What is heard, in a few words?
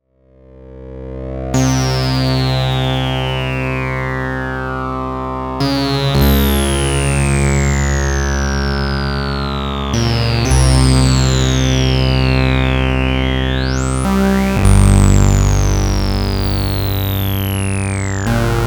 Resonance; GRP A1